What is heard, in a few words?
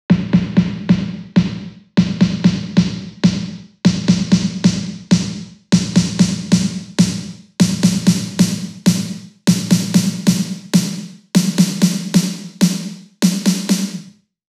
128BPM Salsa Snare; 8Bars; Vengeance Samples; House Electro Fills